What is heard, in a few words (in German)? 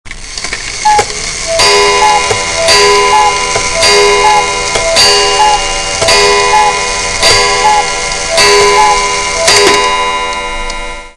Kuckkuck Vogel, Grossuhren